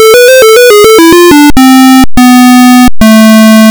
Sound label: next level